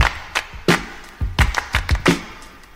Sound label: claps to beats, funky drum loops